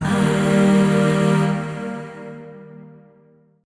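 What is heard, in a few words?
Void Shawzin Minor Chord; Warframe; Zariman; Chords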